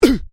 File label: MALE VOCALIZATION UGH; Exclamations; Voice